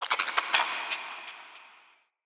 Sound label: Tiny Creature; Ambience; Under City